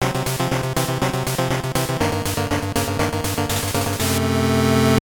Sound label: Jingle